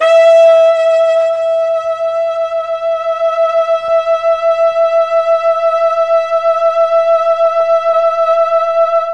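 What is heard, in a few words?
E4 tenor sax, mellotron; CHMB TNR SAX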